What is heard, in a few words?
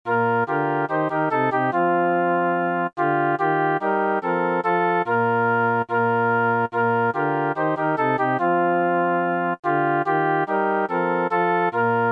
Ding Dong Merrily SATB; Choir